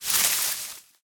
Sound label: bush, human step; bush05gr; Soundscape Overhaul